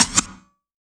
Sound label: Yamaha CS1x Drums